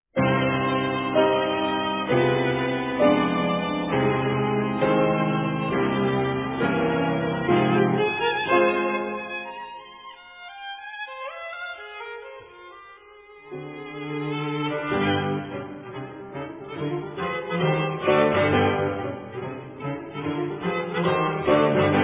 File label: Piano trio in C minor; SMETANA SUK NOVAK; Josef Suk; op 2